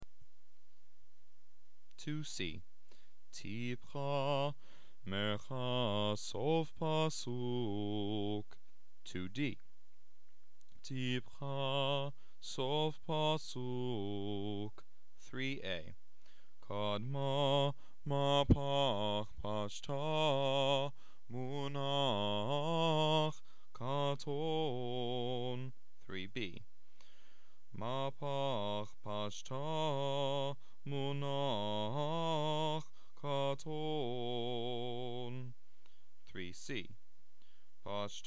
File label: Haftarah Trope B